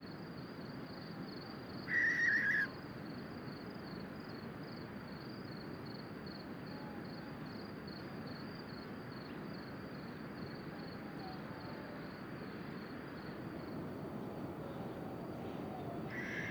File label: Madeira Barn Owl Five recordings of perennial screeches